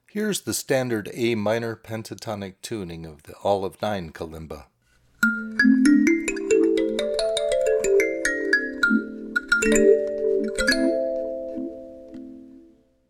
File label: Olive Tuning, kalimba; Olive-9 Kalimba; Bothe A/D Tuning; Magadi Olive-9 Kalimba